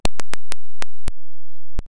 damaging sound

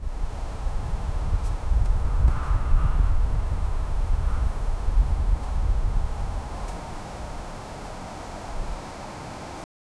a slow wind passing by; distant animals

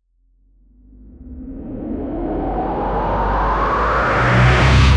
riser sound epic; sfx; sound effect

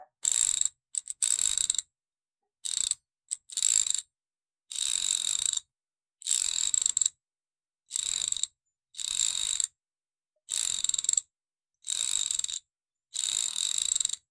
ratchet wrench unscrews; tools

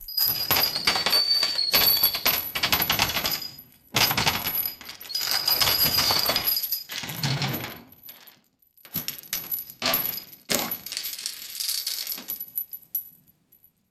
the opening and closing o, castle

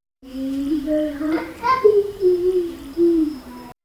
soft indian morning ambie, light breeze

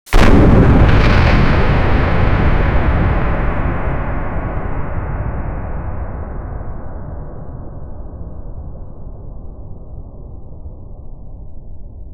an explosion begins with

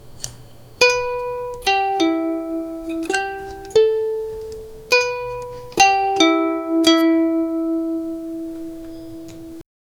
calm ukulele strumming

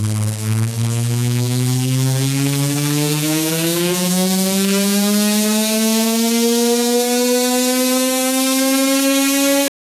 anime scifi booster sound

